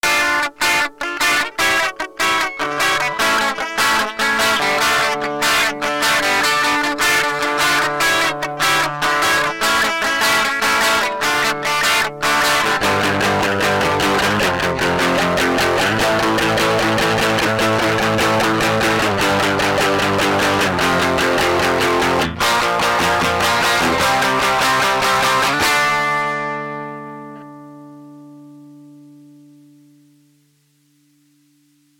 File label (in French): rythm ampli